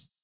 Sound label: hihat; Reference Beat BPM120; j city pop 1980